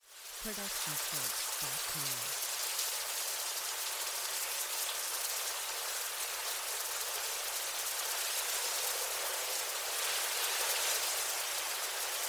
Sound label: Rainforest Storm; SoundsCrate